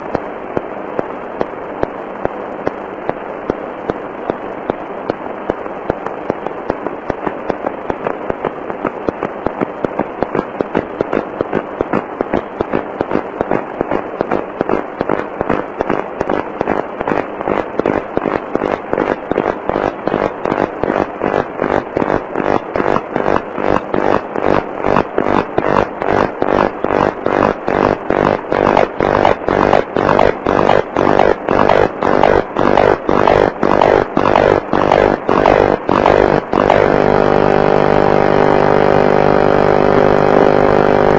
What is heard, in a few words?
Street Light